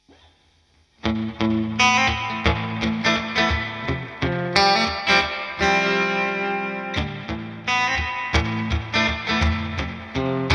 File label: clean, strat vintage